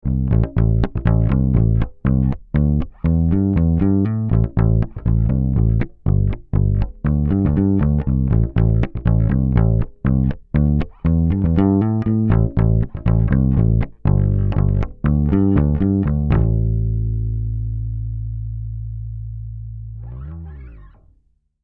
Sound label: SPL Channel One MKIII Bass DI